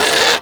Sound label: Combat Vocal Pain; Warframe; Catbrow